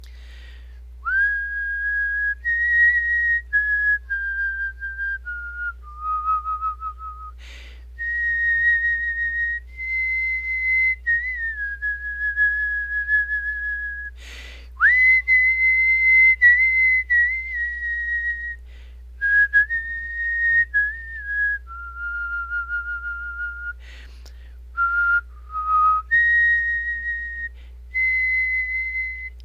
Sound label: Whistling